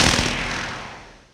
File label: reactive taser cast; techies